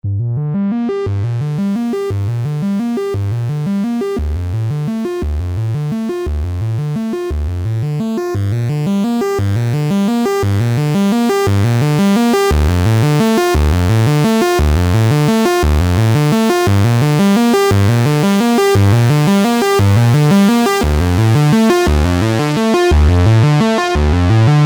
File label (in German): Sequenz Soft Synth SH Filter